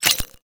token set

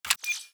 ui station enter

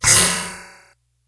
elec mag combine

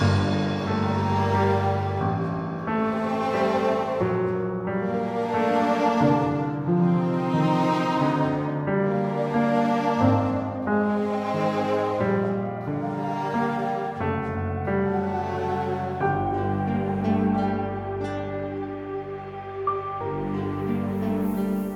drift loop

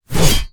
bsword

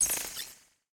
atk electric stick

